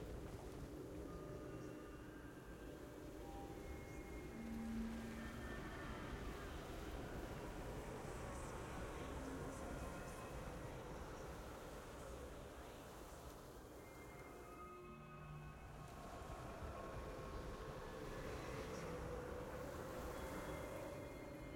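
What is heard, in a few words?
ambience shurima temple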